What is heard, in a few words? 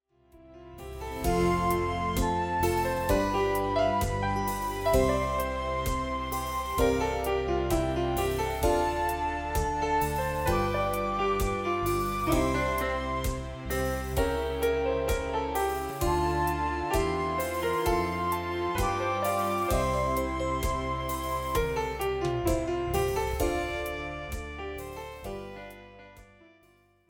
Morning song